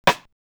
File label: LM-2 RIMSHOT, LinnLM2 rim; drum machine